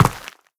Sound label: step run, footsteps, player, dirt